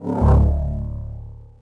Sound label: repulsor Pallet push; objects; Star Wars Obi-Wan; XBOX